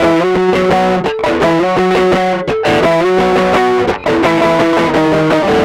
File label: Guitar, Trip-Hop Loops; Sonic Foundry; Rondo Brothers